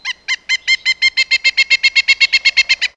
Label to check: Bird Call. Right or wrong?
Right